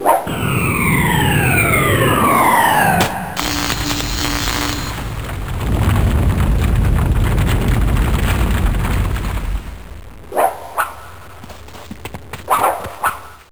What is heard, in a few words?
Wand; Saban; Rita's